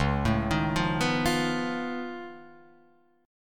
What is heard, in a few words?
Db Augmented 9th Db down Guitar Open F; arpeggiated; JGuitar